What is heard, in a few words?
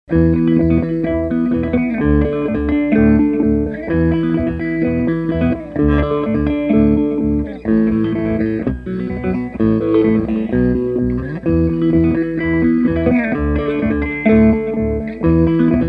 Tap Two, toggle